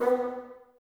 BNS B, Bassoons, Wood Winds; Miroslav Vitous - Woodwind Brass Ensemble; Bassoons Links

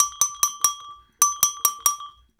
TAP, African; Spectrasonics; Supreme Beats

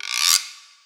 Orch perc; musicradar; cartoon caper

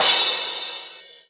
Crash, Cymbals

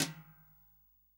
SWING SNARE CHROMATIC, Real Drum Kit; REAL DRUMS

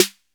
DRM 808 SN, Drum Machine; Roland L-CD701; Cheese menus

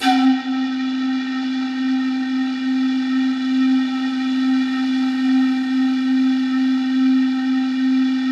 C3, ANALOG SEQ; Roland JV 2080

